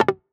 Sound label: Pick Item Fail